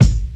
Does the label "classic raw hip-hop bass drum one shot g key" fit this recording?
Yes